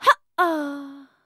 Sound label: VOICE, EASTERN FX; ILIO; Vocal Planet